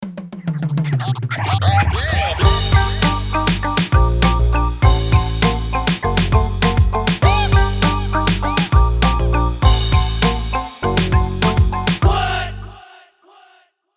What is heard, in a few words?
classic beat; social media